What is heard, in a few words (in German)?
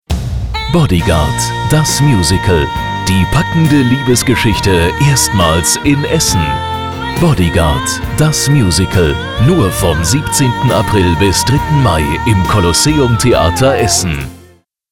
Werbung Musical